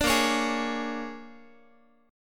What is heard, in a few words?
A sharp 7th Sharp 9th A sharp down Guitar; A#7#9; strummed